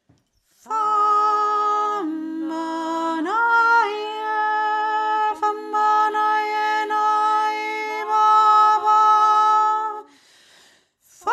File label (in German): famba naje hohe stimme